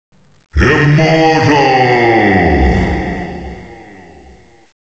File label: immortal